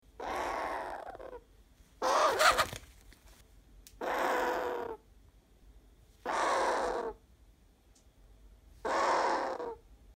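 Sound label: woodcock; validshnep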